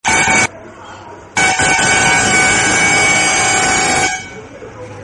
Ksrtc Bus horn

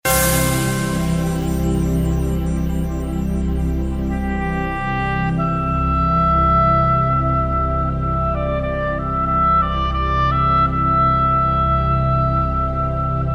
calm melody

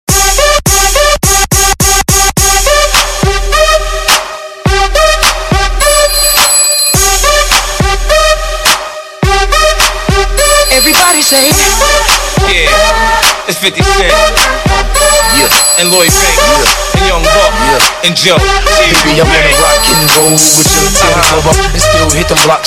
dj rock

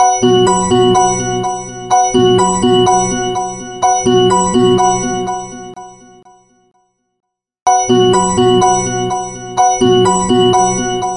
google ringtone

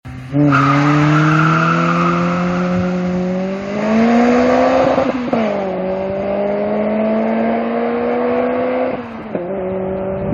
acceleration car

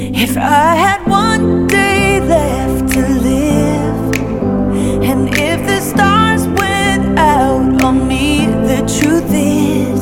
Only Love

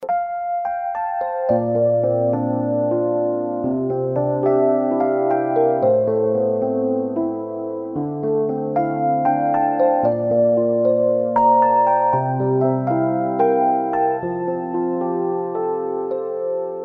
calm

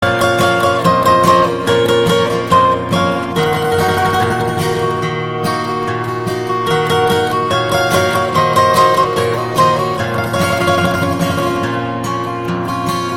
Guitar Romantic

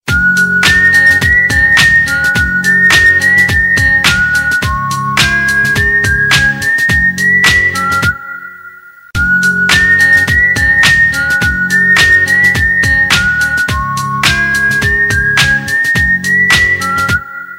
whistle call

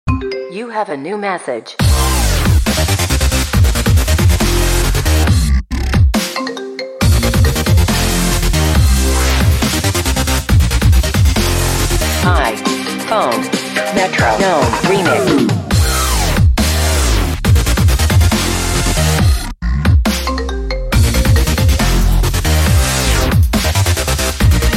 iPhone Dubstep, ringtone; apple

